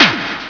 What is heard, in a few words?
FIRE; TANKHUNTER; SHOOT'EM UP